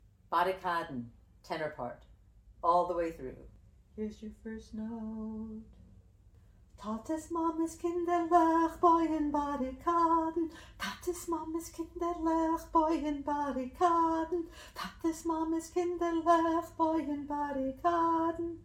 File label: tenor